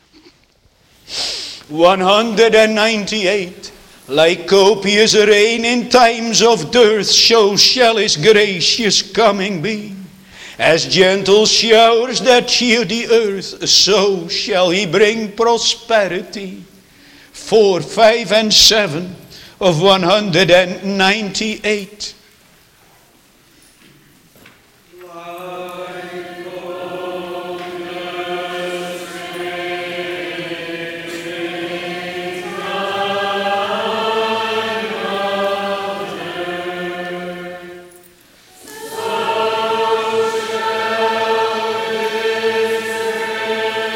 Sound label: Singing